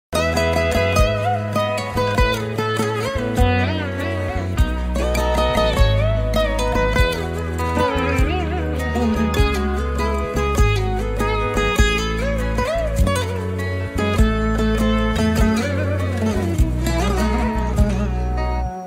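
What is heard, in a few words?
heart touching love background music ringtone; sita ramam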